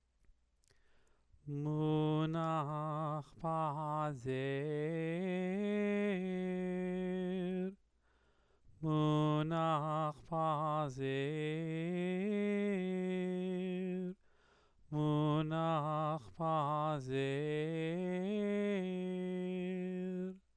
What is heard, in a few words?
Megilat Esther exercise; prayer; megilat esther blessings and trop